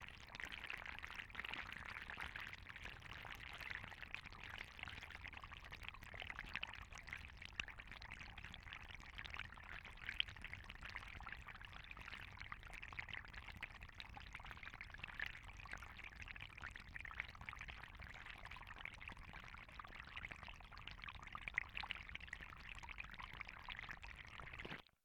Underwater

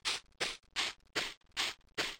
Footsteps Grass

